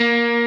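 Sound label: Arpeggio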